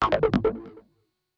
FX SHOT TEKNO 140; Techno2; Singleshots

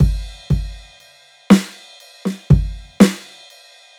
Rolling Break, Loops

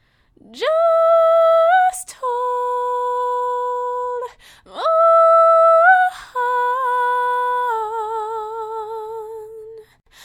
Chorus Lead

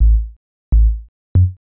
HOUSE BASS 125 A

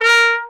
Trumpet staccato A#3; Orchestral; DirectWave; Varazdin Orchestral

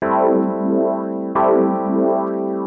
PIANO 90 A; Keys

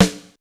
HOLZSNARE, snare drum; SNAREDRUMS

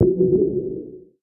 One Shot, Hits; One Shots; Touch Loops; ElementsOne; Abstract Rhythms

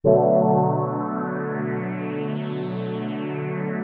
house synth 125 A, keys

